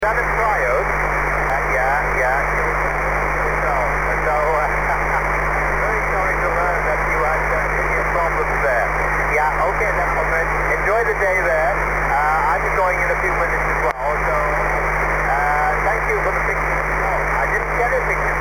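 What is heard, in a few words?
20 metre spacial diversity; without Noise Reduction; two loop antennas in the same direction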